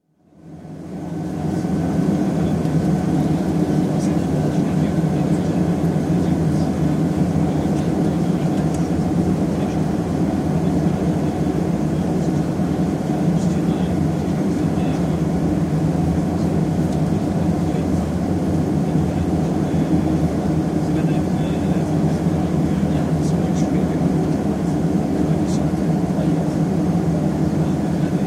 Shorts 360 Commuter Transporter, interior, run-up engines, taxi (2 turboprop engines); Airplane Sound Effects; BBC